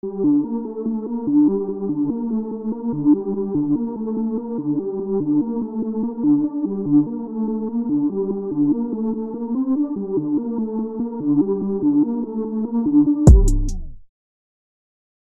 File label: Chill Beat